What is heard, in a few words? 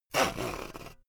saw use long slow